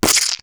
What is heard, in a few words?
splat; pygame tile